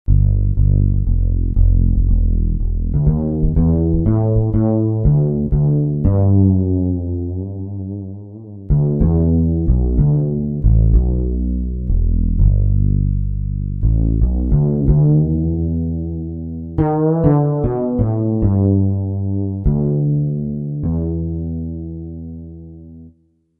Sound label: Fretless; Factory Patches